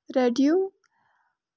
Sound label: radio